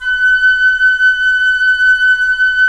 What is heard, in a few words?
FLT FL, Flute; C Flute p; Roland - LA Composer vol. 4